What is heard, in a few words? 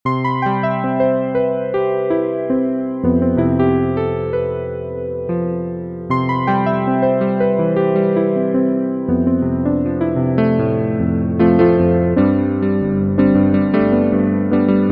CP70, Old School Keyboards; Universal Sound Bank; Soundscan 09 Old School Keyboards